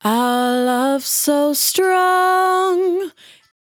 100bpm Bm, Velvet Vocal; Zero-G

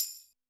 TAMBOURINE, Yamaha SY85 Drums

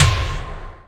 PERC FX

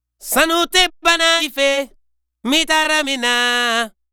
African Vocal Double; Continental Drift Aftershocks; Vocals

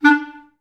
STAC D3, Orchestra; Best Service; Peter Siedlaczek's Advanced Orchestra

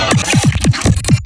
Glitch

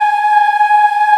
G#4 CHI IH-L, Choir; CHILD IHF

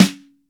SN, DRY STANDARD SNARE

